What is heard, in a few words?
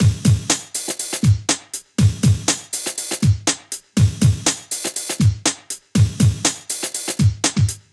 Breaks, 4-Floor Breaks Kit